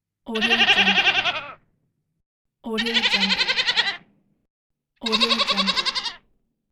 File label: goat bleat